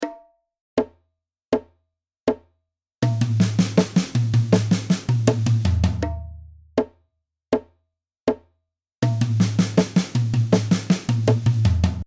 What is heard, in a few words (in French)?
Fills Niveau 2 Ligne 3 80bpm